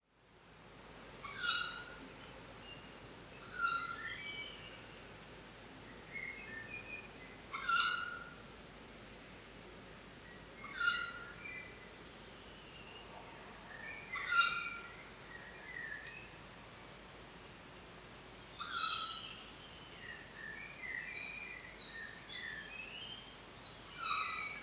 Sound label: Tawny Owl Strix aluco